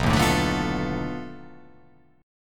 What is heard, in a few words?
B 7th Sharp 9th Flat 5th, down